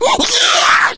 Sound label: rage at victim, infected, male